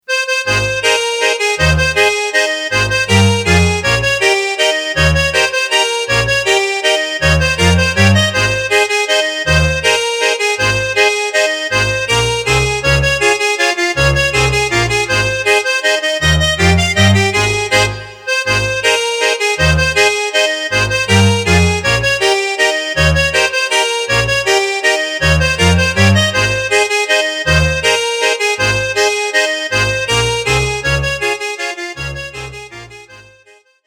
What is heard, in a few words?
Mazurka